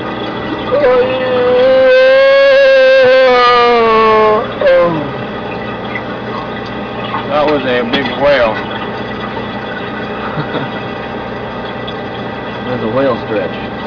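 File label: long stretch, Our leading sonarman stretches and groans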